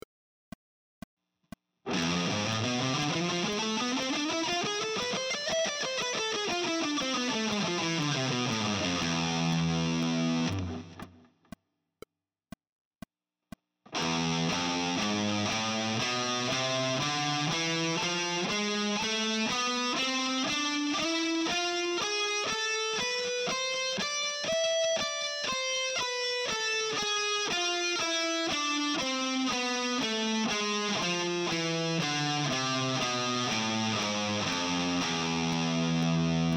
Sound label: harmonic minor